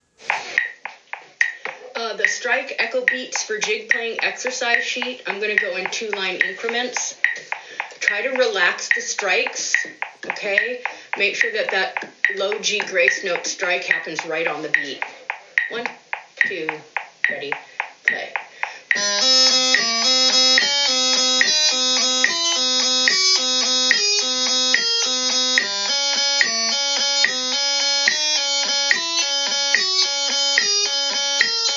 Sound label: Jig Exercise 3 strikes, pipers